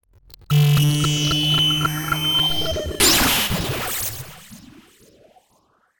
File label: sound design lo fi glitchy build hit